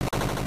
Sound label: lightning; Super Smash Land